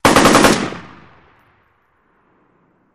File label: Machine Gun Sngl Burst; bursts